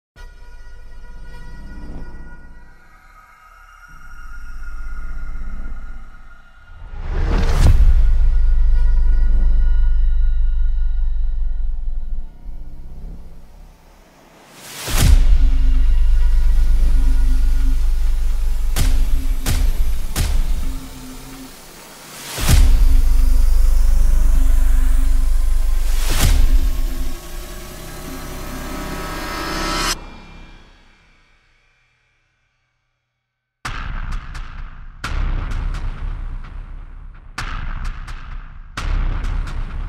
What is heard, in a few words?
trailer music epic hybrid horror sound design; trailer music from the vault